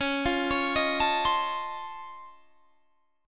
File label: Db Augmented Major 9th, down; Db+M9; arpeggiated